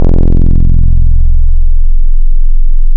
Sine Pulse; Wavetables; Synthetic's Serum Collection; ESW Core Wavetables; Echo Sound Works Core Tables

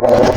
Penguin, Animals for Serum Wavetables; Synthetic's Serum Collection; Cymatics - Animals for Serum - Gold Edition Wavetables

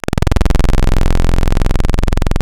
Pulse PWM; Synthetic's Serum Collection; XLNT-Skrilla Tables

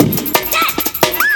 break loop 175, Breaks (Loops); 'Effection' samples

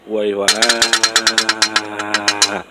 Hitting A Wrench On A Metal Plate Rapidly

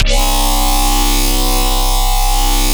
Whap, Noise; Cymatics; Shapeshift

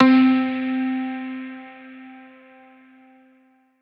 FreeFall Guitar C3; Aubit; Awake Vol. 1 Serum Noises

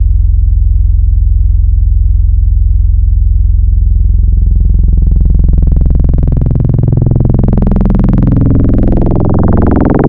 Fm Gentle Speak, Serum Wavetables; Synthetic's Serum Collection; Cymatics - Nuclear for Xfer Serum Wavetables

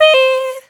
Noises Vocal Shot; Synthetic's Serum Collection; Production Master - Vital Future Bass 4

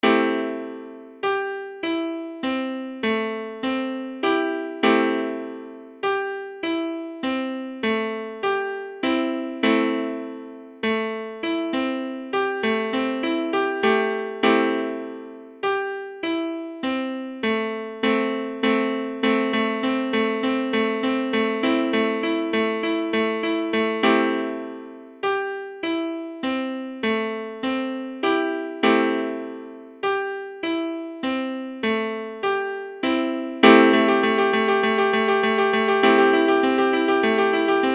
piano; Piano 4 Arrow

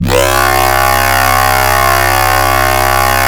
Desert, Noise; Cymatics; Shapeshift

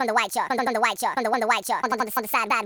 vocal; Happy Tekno Track Contest SamplePack

